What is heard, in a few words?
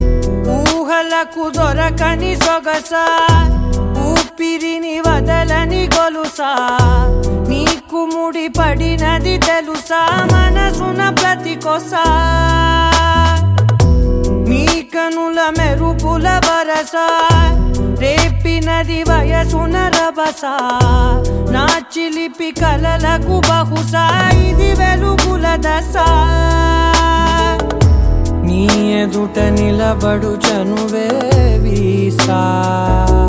ringtone; telugu ringtones